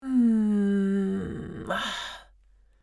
Old lady moan in pain

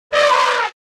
Elephant trumpet

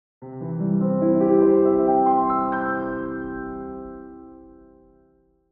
Cartoon victory

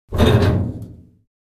Metal beer keg drag and scrape

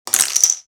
Darts dropping on floor; sport

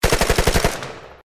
Steyr AUG light machine gun short burst

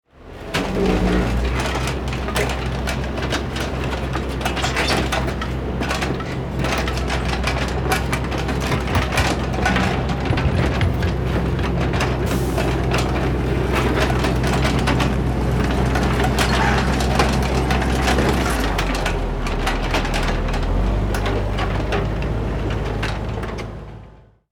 Excavator construction vehicle bucket move

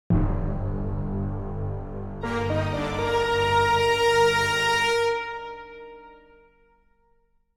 Riser build up cinematic tension suspense

